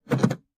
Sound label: gearshift